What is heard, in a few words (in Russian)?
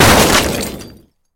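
громкий грохот упавшего металла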